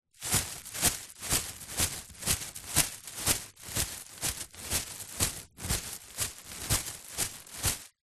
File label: foley plastic carrier bag full walking movements